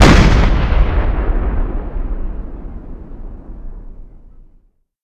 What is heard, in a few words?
explosion high; Weapons; Explosives; Explosions